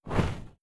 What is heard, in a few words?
Open Chest